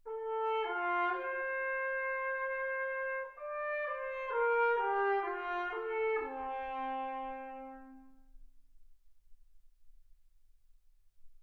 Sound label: trumpet